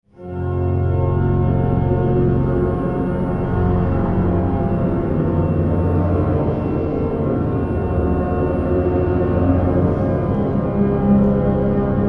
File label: Hymn; Circa 1594; All People That On Earth Do Dwell; Singing